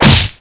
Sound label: hit; slender; yakuza; kiryu